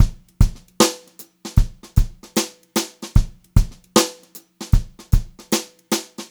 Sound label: Brushed Drum Loops